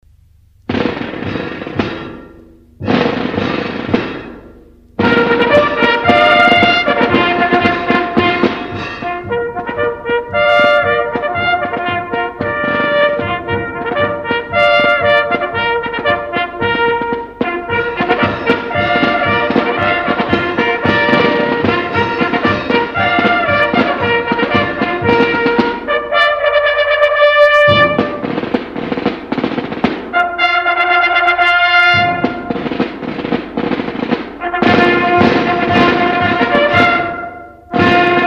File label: Band